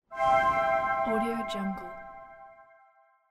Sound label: revive spell